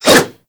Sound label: Dagger Heavy Whoosh; Warframe